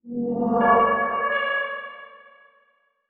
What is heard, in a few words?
Title Transition Reverse Fly By Reverse; Production Element